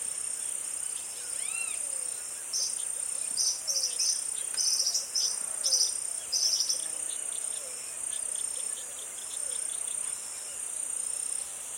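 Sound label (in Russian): негромкое пение птиц и стрекот кузнечиков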